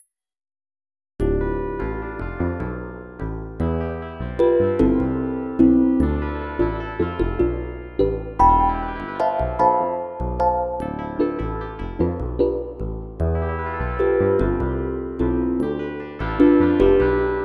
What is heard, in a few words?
drum